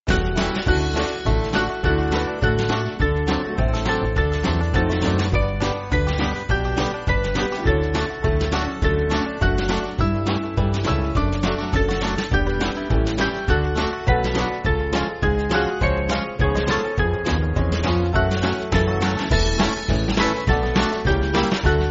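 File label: Band, Music Backing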